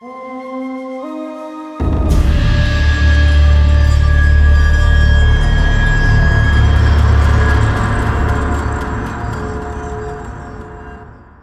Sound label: Round Start; Zombie Island